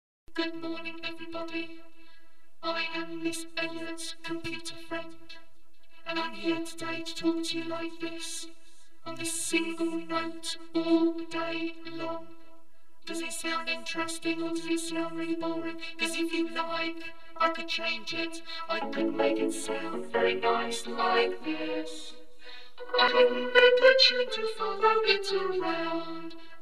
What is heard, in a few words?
frequency; Synthia Sizer